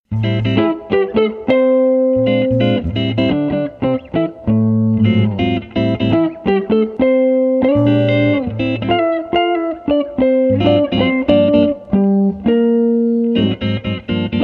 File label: Clean Input Rhythm and Lead T.H. Neck